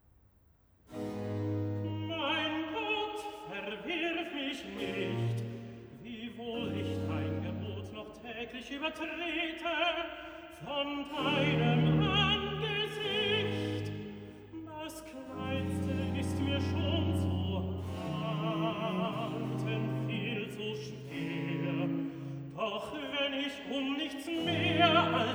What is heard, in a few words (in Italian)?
Cantata, Recitativo (Tenor); BWV 33 'Allein zu dir, Herr Jesu Christ'; Bach; Frankfurt; 13th Sun After Trinity